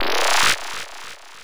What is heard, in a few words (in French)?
boule